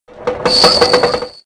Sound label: Base Claw Drop; Playable Tutorial Level